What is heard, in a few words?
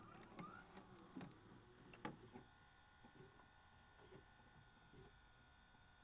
startup